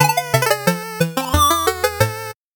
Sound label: 8bit jingle fast